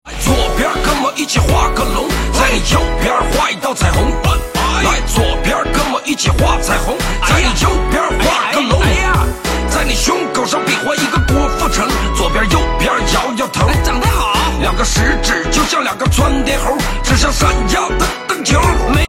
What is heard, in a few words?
disco rap